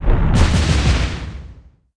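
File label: Battle Fire explosion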